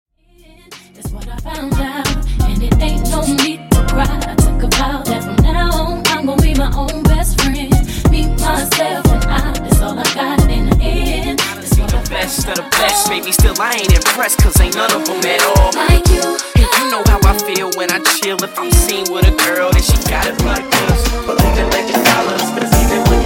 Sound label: Hip Hop RB Old School Mix Megamix, 90 Bpm